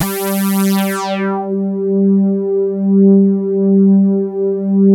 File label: reso pad; Sound Gallery - Charged